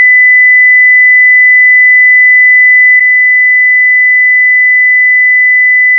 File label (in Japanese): 2000Hzの音データ/基準音と低減音[警報音など]; ペアガラス対応